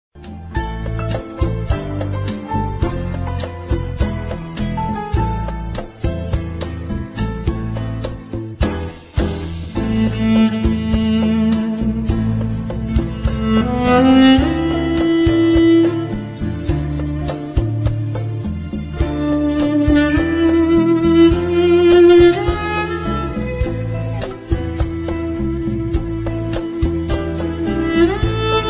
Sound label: Cello